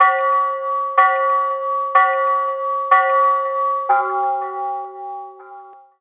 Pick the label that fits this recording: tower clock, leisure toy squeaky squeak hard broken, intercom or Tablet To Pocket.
tower clock